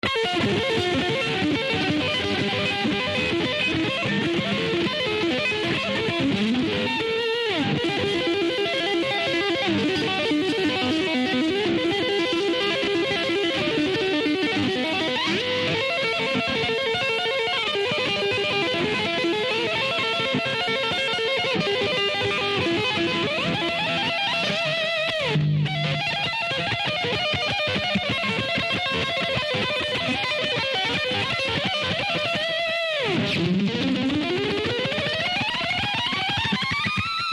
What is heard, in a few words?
Solo